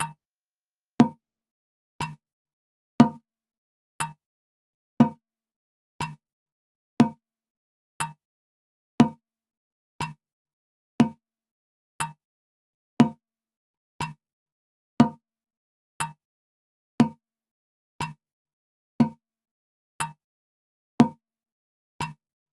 waterphone clock ticking tick tock click rhythm time watch countdown; sonniss gdc